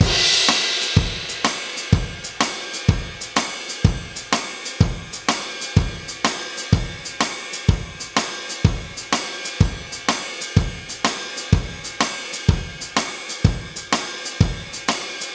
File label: Drum Loop, 125